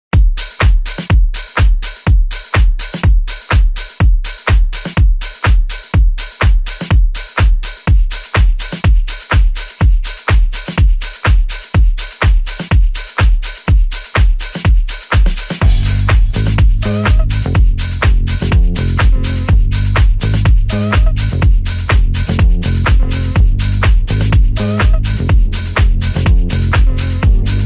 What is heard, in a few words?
vocal extended remix